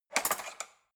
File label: cutlery fork drawer handle scrape soft